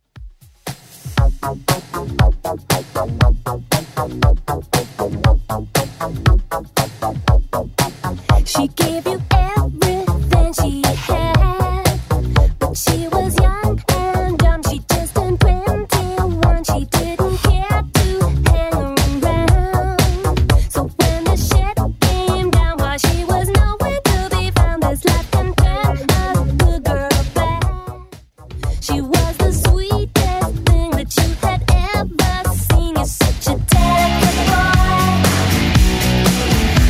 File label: 118bpm; Redrum